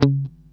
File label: C#3, funky guitar